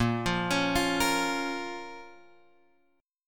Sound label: A sharp Minor, down, Guitar, Open F; arpeggiated; JGuitar